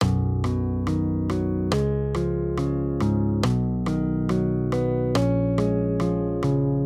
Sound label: maj triads